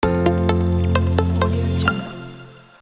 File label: corporates logo